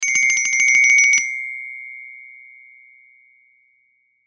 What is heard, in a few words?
Xylophone Trill; Mallet Sound Effects